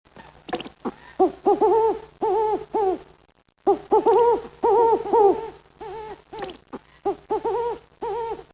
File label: Owls